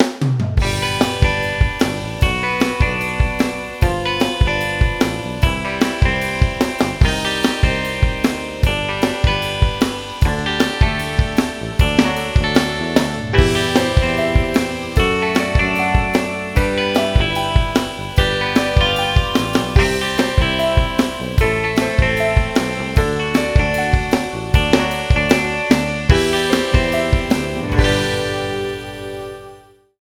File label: no ac gtr